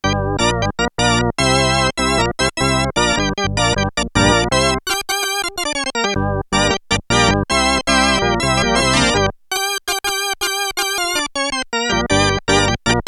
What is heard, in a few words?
Korg DW-8000 NEW WAVE ORGAN II, Factory Patches